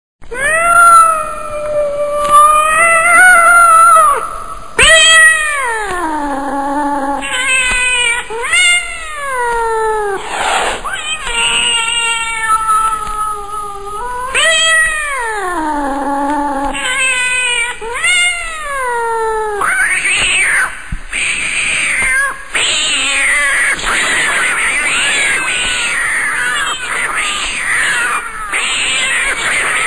cats fighting

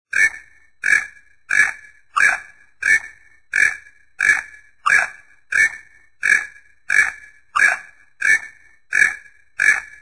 toucan

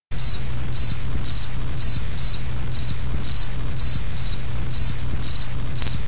crickets

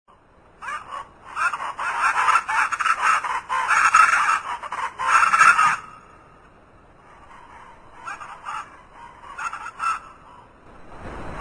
chachalaca

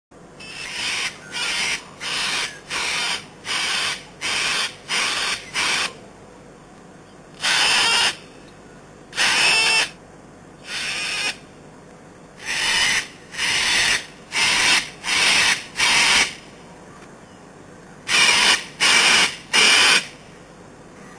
cockatoo

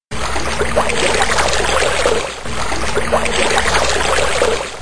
stream small

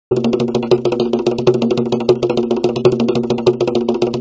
jungle drums

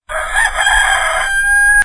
rooster

